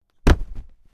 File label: boom; deadmaze